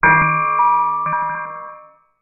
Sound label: impact metal big